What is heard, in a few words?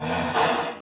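attack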